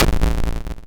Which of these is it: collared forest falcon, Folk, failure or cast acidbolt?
failure